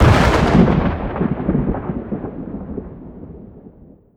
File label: bolt huge thunder